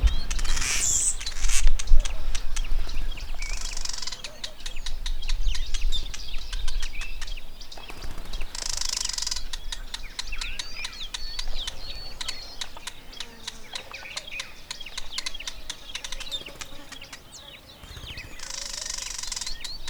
Ploceus velatus

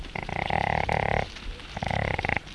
Saiga tatarica female

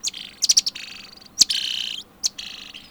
Saguinus fuscicollis

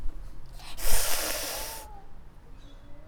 Felis viverrinus hiss

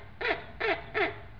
Chinchilla laniger

